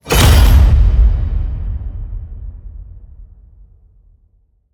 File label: HIT Metal Crash, Cinematic Hits Designed